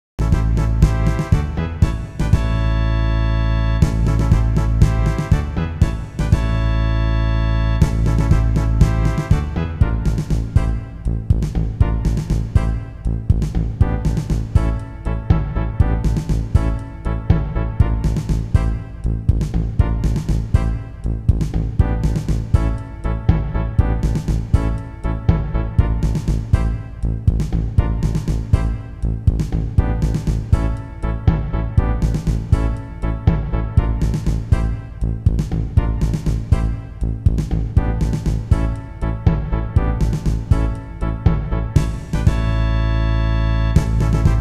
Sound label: fanfare; Isangrin; loup y es-tu